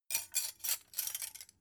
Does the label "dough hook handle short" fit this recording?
Yes